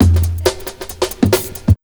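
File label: Big Beat Drumloops, loop, left; USB Soundscan vol.25; AKAI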